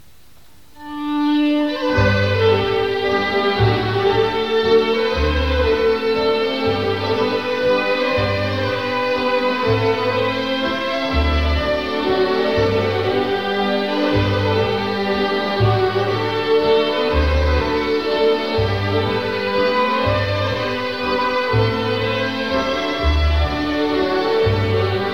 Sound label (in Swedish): Polska